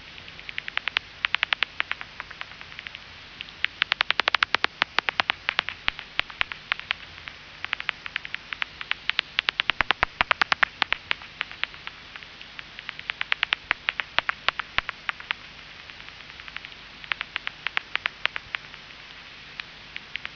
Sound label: pipistrellus nathusii; chauve souris; sonotheque